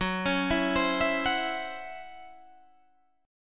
C sharp Minor Major 7th Double Flat 5th F sharp, guitar chord